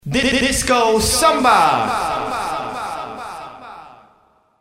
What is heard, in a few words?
samba